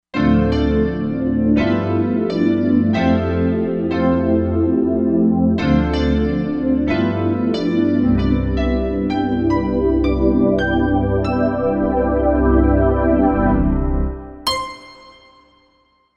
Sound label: Pop Keyboard, synth